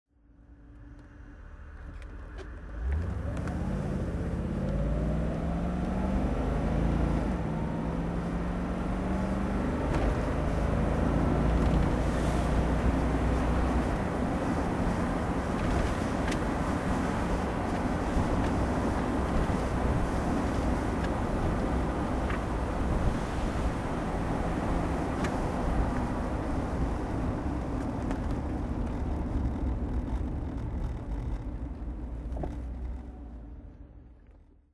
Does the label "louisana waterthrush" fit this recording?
No